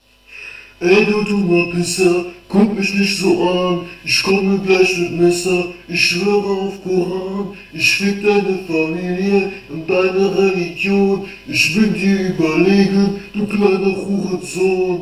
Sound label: nasheed